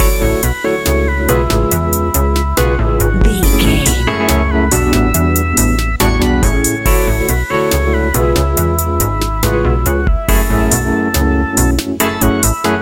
A relaxed and chilling piece of music, great for light documentaries, background music for tv shows, lift music